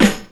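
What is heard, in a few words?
SD, snare drum; X-Static Goldmine 1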